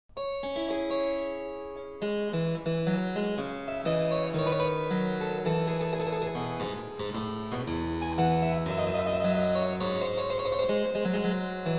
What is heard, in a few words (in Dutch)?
clavecimbel